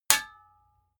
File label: sword metal hit ring